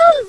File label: Kobold Whine; Death Blade; Creatures